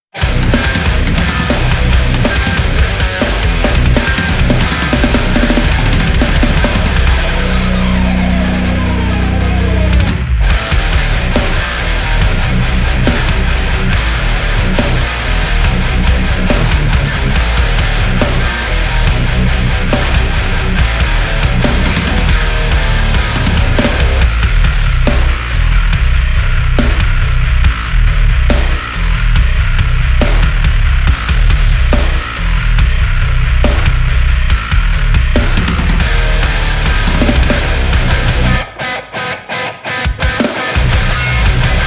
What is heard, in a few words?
bass; avedis mutter monolith; polarity audio